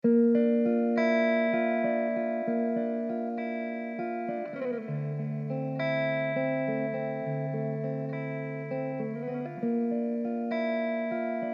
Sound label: Dm100 Jazzy GTR chords